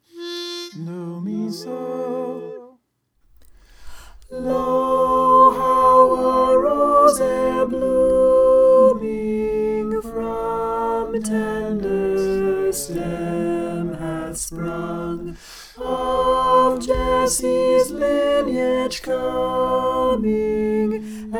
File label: soprano